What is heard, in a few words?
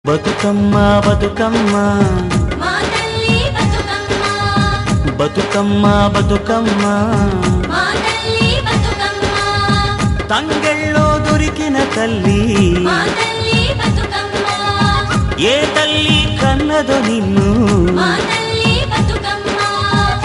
telugu song